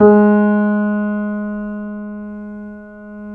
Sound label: KEY, YC7; Roland L-CD701